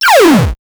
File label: retro strategy game, laser